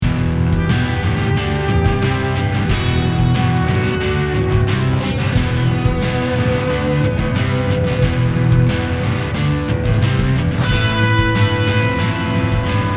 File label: tiny metal